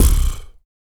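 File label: vox percussion, Vocal Planet Jazz FX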